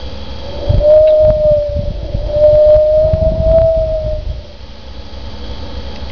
windy weather blowing through reeds whistling

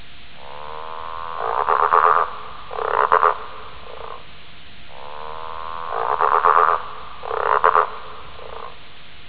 Golden Bell Frog

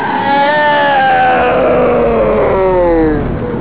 monster groans

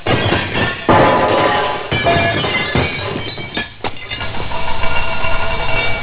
dishes crash

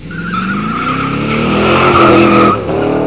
car speeds off squills tires

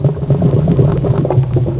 boiling full pot of water

